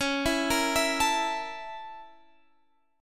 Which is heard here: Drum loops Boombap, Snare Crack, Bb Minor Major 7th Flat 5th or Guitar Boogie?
Bb Minor Major 7th Flat 5th